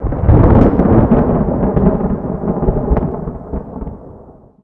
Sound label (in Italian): temporale, natura